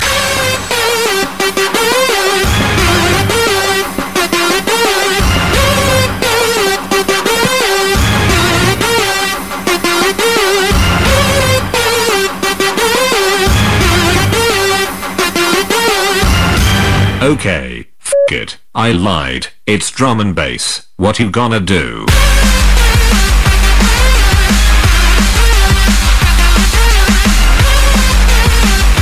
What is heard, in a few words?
Drumbass